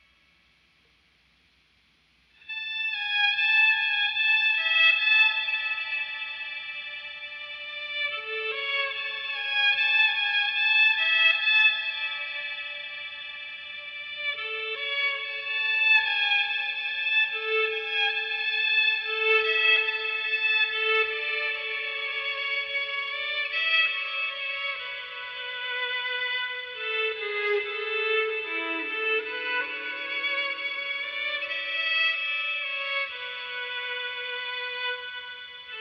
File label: Violin